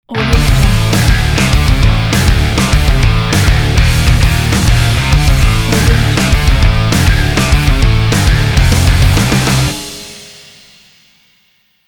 hard rock logo

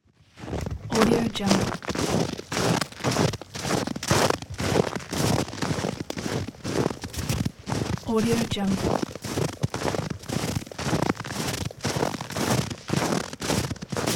footsteps crunching in snow

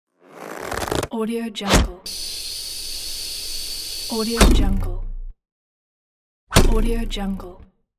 archery arrow

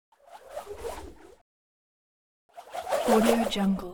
swish twirl short spinning object fast spurts

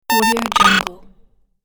tone sensor to glitch

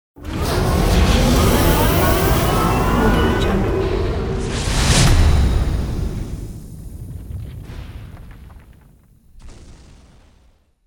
big machine

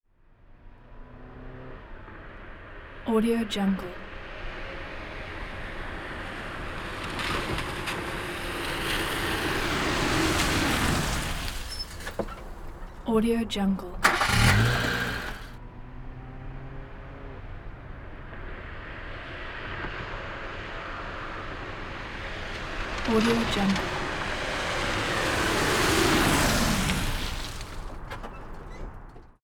auto through puddle in and stop turn off; prosoundfx

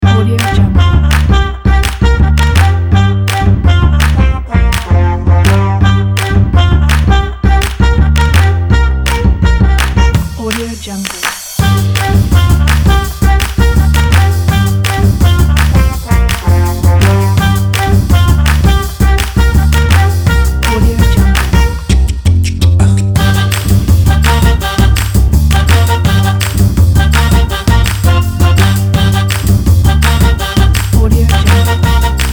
retro quirky vibe

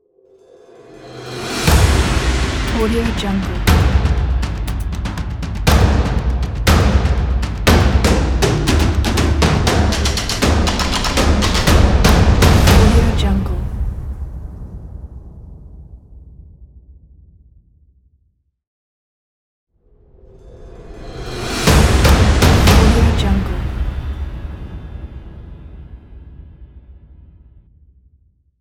drums logo

